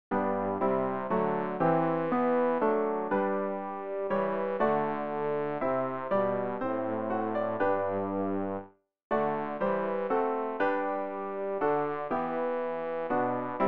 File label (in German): bass; Ref. Gesangbuch; RG 491: Gen Himmel aufgefahren ist